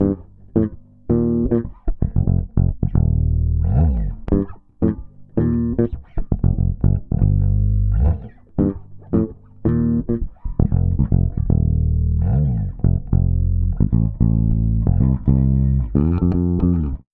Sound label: 112 C DISCO BASS; 112 BPM DISCO TWO C